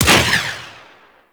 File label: Space Turret Shot C; Warframe; Ambience; Space Battles